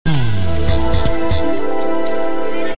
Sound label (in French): Jingle pub; Autoroute Info